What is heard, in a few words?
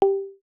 Beacon; Apple; iOS-18; VectorKit.axbundle